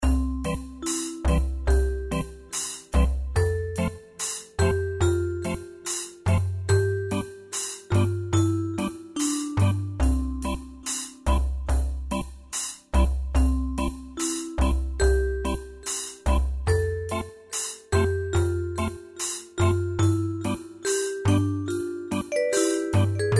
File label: reggae, エレクトロニカ; 明るい; 穏やか